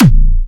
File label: Springer A#3, Big Room Kicks